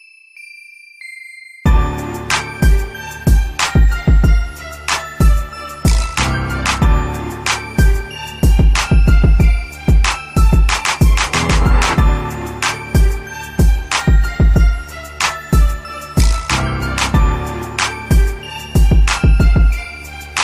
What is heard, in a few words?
black beat, hip hop